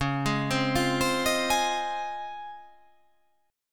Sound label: C sharp Major 9th C sharp